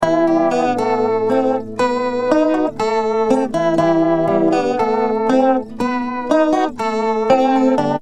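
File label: acoustic guitar Voce spin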